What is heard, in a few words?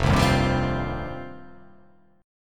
F 7th Suspended 2nd